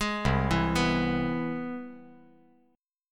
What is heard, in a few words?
C Augmented Major 7th